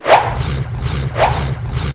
Hat Spinning; PC; MARIOTEACHESTYPING2

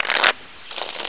Card Shuffle; PC; MARIOSFUNDAMENTALS

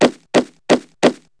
Clomp Clomp Clomp; MARIOTEACHESTYPING2

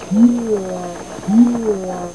groan; seedy; GAMECUBE; SUPER MARIO SUNSHINE

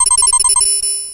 stars appear; N64; YOSHISISLAND

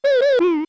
enemy death sickle; SNES; SMRPG

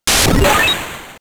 enemy static E; SNES; SMRPG

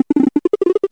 freeze Breath; N64; YOSHISISLAND

